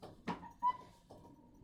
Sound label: Submarine Metalsound